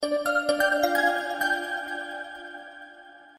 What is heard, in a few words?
Tone Once; Galaxy S4